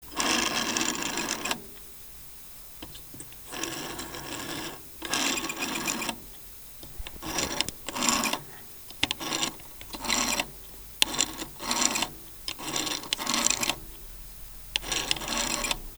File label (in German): waehltelefon